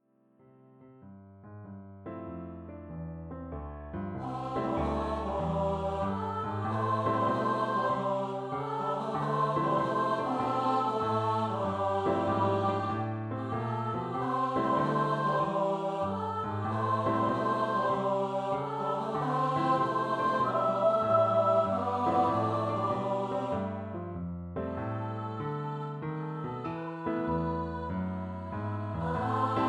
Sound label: 2 part song for SATB Choir